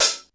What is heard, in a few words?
close hh, Sequential Circuits Studio-440